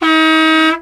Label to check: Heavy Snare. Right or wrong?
Wrong